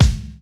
kick, house

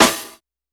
Hiphop snare

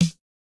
no effect j city pop 1980 snare

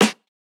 Electro snare; Reference Beat

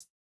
no effect j city pop 1980 hihat

